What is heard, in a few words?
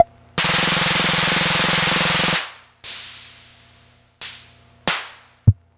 wurlitzer, Samples Sliced; m8